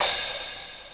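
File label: crash cymbal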